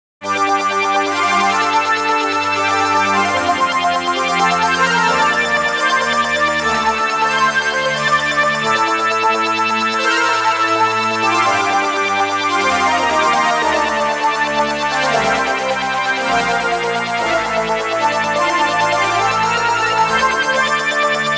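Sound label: rhythmic alamach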